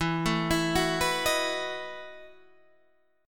E Major 7th Suspended 2nd Suspended 4th, Guitar, Standard; EM7sus2sus4 arpeggiated